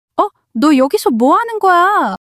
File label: surprise